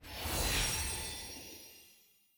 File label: receive blue essence